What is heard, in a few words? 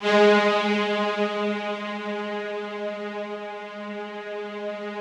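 Yamaha VP-1, rough strings G#2; 90 s Sample CDs; Music Store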